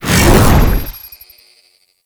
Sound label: Grineer Death Squad Teleport; Warframe